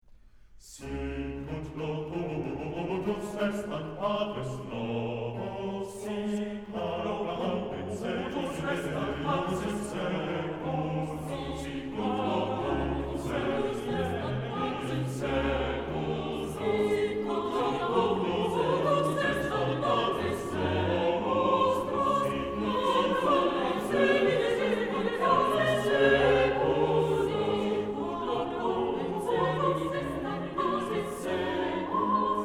Magnificat BWV243, D Major; Johann Sebastian Bach

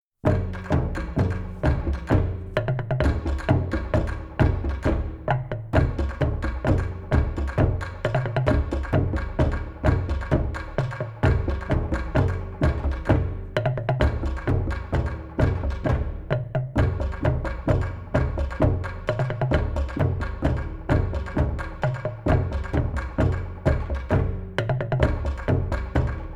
Distant Cannibal Drumming; Return to the Blue Lagoon

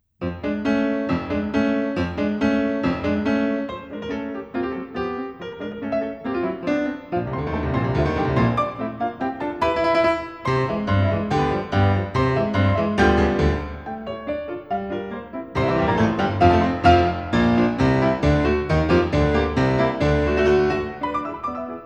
for piano 4 hands, Polka; Erik Satie; Trois petites pieces montees; Jeux de Gargantua (Coin de Polka)

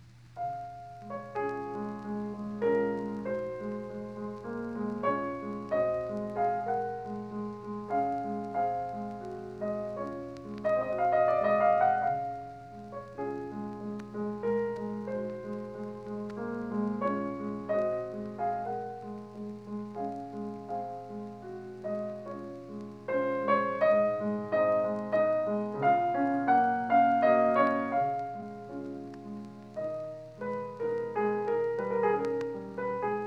Prelude in D-flat major; Frédéric Chopin; Op. 28 No. 15

